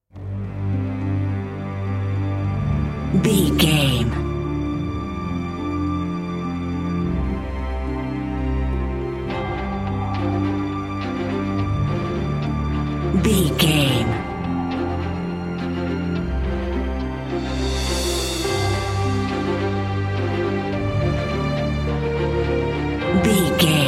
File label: epic cinematic no drums